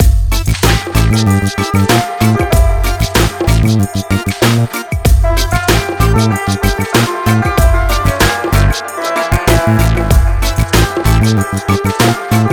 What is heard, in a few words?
Cinematic Upbeat; Shantae Half-Genie Hero